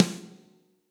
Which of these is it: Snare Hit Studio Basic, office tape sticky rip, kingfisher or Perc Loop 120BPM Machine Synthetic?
Snare Hit Studio Basic